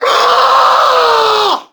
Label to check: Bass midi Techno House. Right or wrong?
Wrong